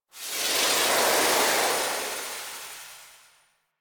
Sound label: Golden Maw vision disappear; Warframe; Ambience; The War Within